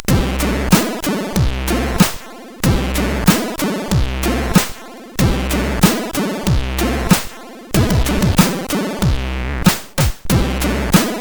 Live, CSG8580R5

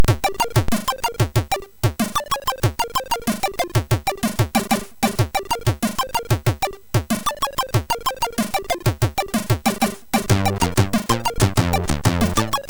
acid, MOS6581R3

